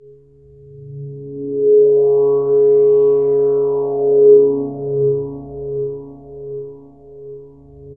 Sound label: Pad; Dream Zone